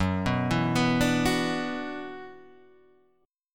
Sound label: F sharp 7th Suspended 4th Sharp 5th, Guitar, Half Step Down